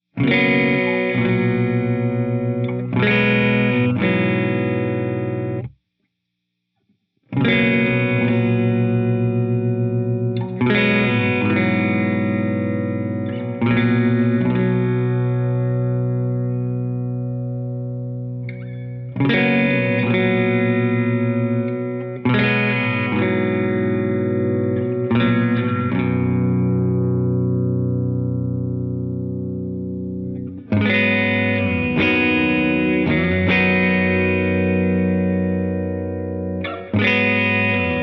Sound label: Hall Concert, reverb; Noon2